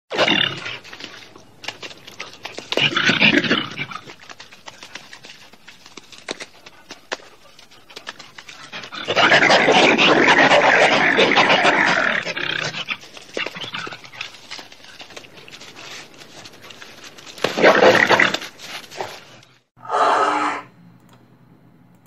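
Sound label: wolverine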